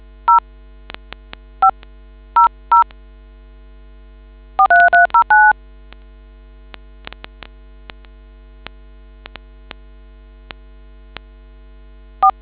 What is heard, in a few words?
dtmf